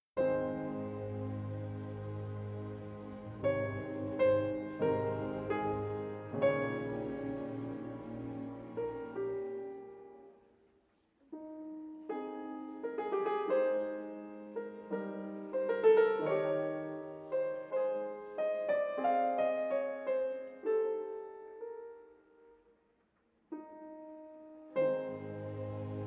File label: Piano Concerto Largo; Beethoven; Piano Concerto 1